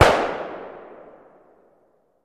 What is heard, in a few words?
Gun Shot Sngl Ext